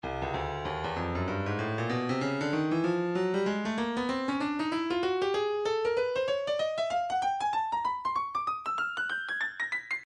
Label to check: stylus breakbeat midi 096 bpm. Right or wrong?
Right